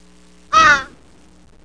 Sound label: Single raven caw